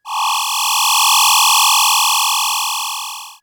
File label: Hum; RetroGamesSoundFX